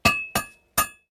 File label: Brick Bolster; Prison Architect